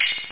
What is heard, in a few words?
tambor, cymbals; da capo vol1; drums